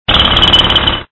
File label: jackhammer; demolition 2